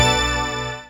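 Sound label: synth dance; Best Service Gigapack 1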